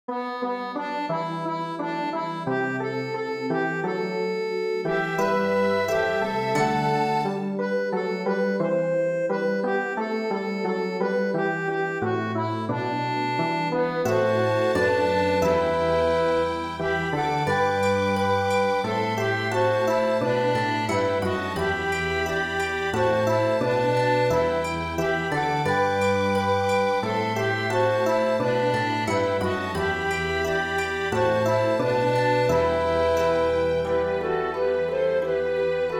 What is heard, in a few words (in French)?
ORCHESTRE